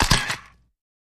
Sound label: 35mm Camera Auto Adv